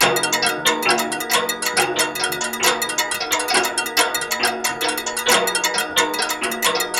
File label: TABLURA